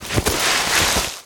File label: dying crawl